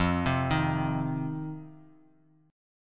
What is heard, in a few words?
F 5th 7th F, chord; arpeggiated